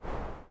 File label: windows xp minimize